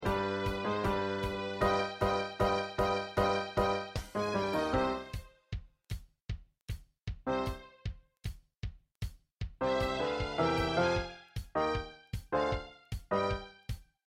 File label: Accompaniment